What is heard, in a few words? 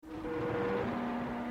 gearshot; recorded live, Porgy and Bess, Vienna, 11 apr. 98